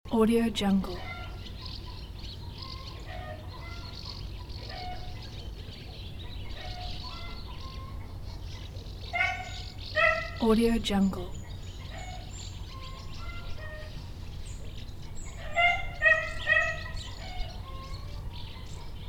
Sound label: countryside farm ambience with swallows loop; آمبیانس روستا با صدای; nature sounds